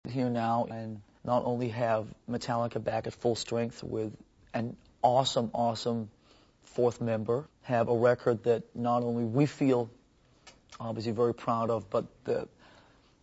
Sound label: metal